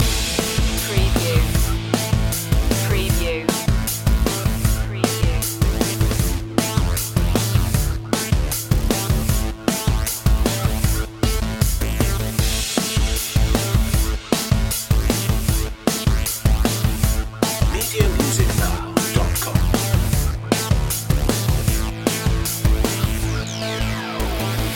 crash course loop

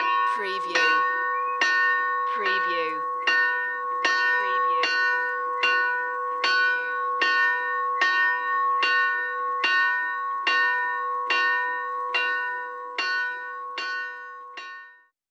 Bells Interior Bell Tower

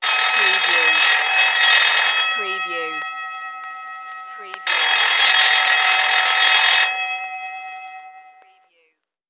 School Bell Vintage

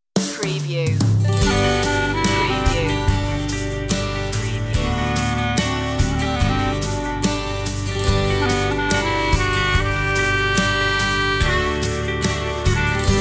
children group vocal alt

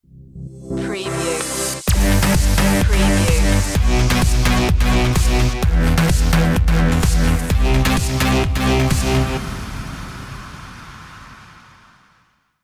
trance stinger

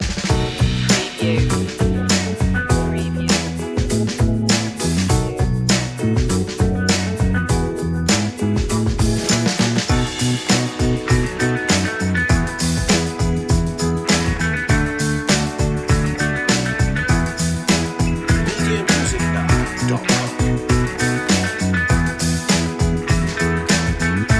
smooth deal

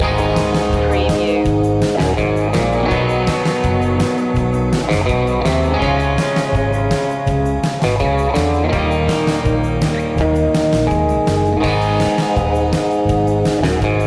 surf loop

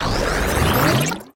Rewind

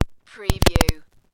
Microphone Short

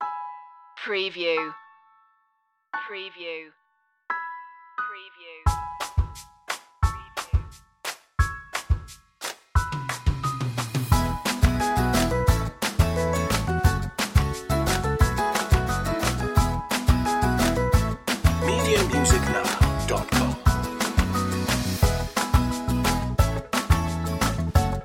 pop

